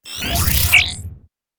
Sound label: Test Start; Yu-Gi-Oh! Master Duel